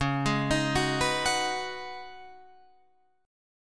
C sharp 7th Suspended 2nd Suspended 4th, C sharp, down, Guitar, Open F; arpeggiated